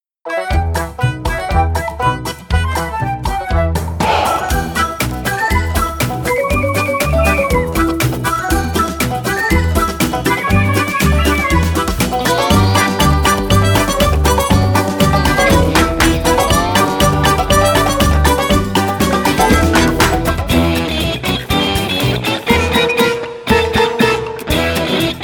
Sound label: Theme; Angry Birds; 2015 Version